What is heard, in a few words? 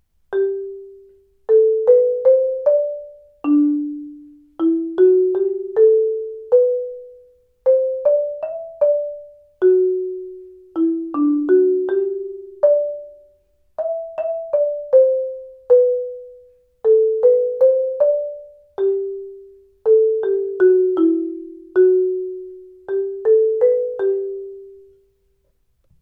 mallets